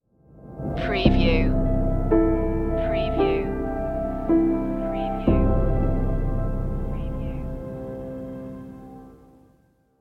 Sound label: piano drops sting